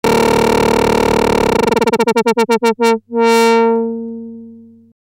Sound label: lfo speed sh101